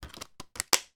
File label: cream cheese tub lid place on; food